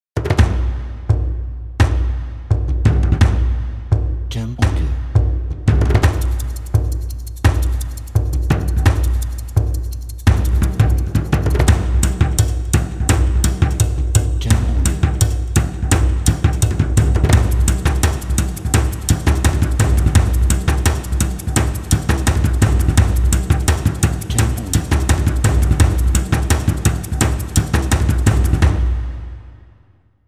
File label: Percussion African